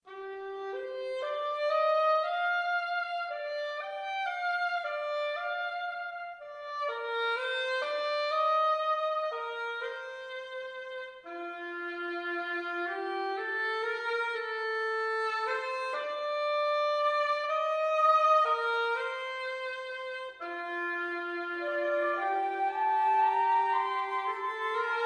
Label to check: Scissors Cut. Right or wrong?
Wrong